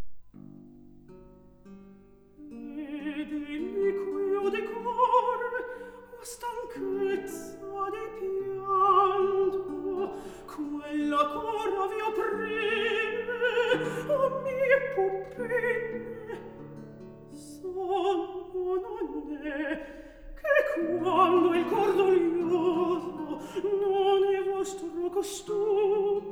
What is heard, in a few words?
Recitativo; Atto II - Scene 8; Griselda; E deliquio di core